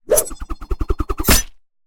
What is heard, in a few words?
knife throw hit, rogue; A succubus is born; combat